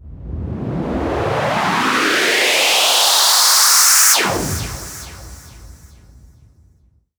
Sweeps Medium

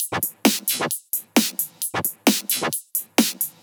Loop, 132BPM, Drums & Perc; G#major

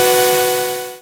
Synth Sound; Vengeance Samples; VEE Synth Shots

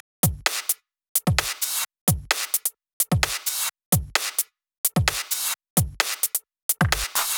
128BPM Drums; Vengeance Samples; Machine Kit

